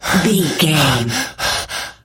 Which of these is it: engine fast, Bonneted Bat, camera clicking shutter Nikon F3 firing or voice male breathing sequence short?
voice male breathing sequence short